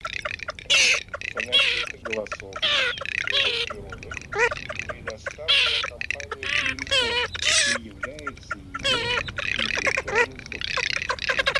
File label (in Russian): Чирок-трескунок Marzaiola; plurifon